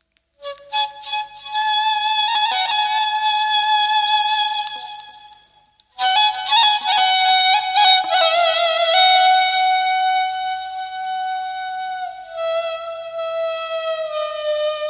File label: Ney Owj